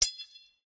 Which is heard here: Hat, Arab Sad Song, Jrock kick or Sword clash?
Sword clash